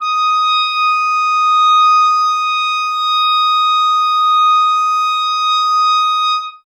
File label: clarinet, D#5; Best Service ProSamples vol.51; Classic Orchestra 2